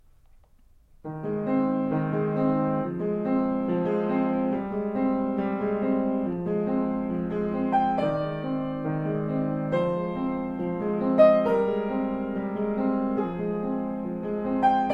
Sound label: Cue Music; Albumleaf 102